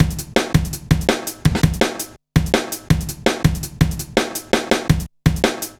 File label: Heavy Live, Jungle, Drum Loops 165.5